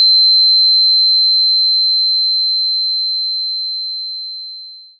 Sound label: 4096Hz Crystal Tuner